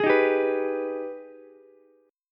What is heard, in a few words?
Ab Minor Major 7th, down, Ukulele; AbmM7; strummed